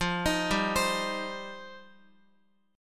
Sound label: F 7th Suspended 2nd F down Guitar